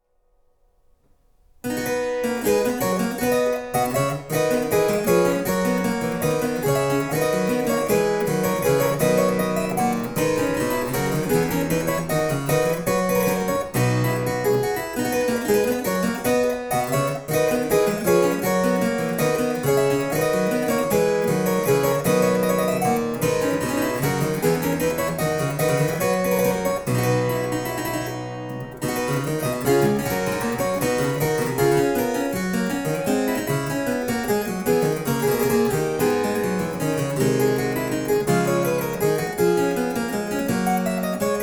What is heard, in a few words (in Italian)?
Var corrente Italiano, harpsichords